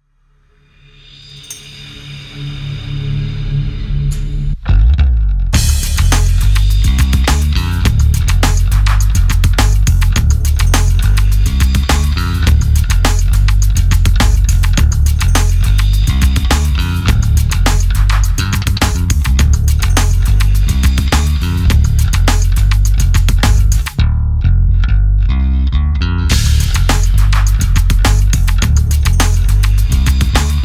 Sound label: Bass